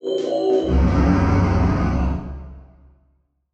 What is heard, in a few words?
Title Transition Evolver; Production Element Title Transition